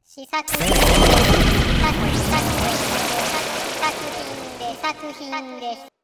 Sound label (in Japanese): 効果音